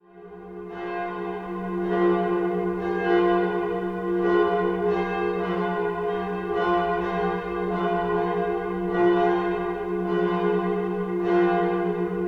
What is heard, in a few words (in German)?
2 Glocken Ausschnitt